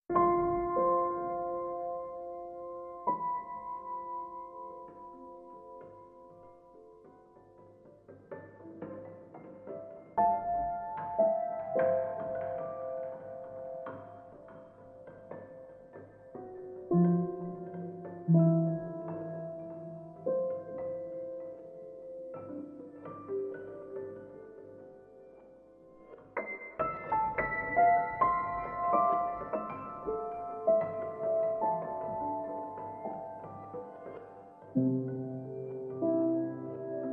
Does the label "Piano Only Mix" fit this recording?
Yes